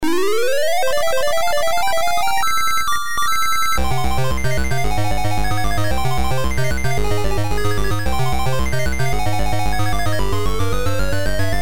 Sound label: arcade round clear; super qix; terror